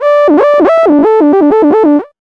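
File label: synth glide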